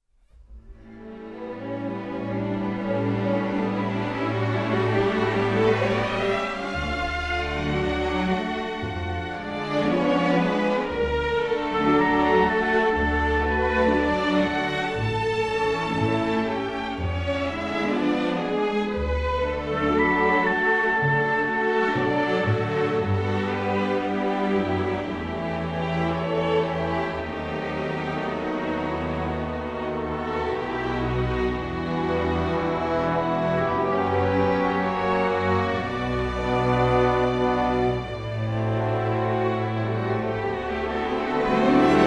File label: strings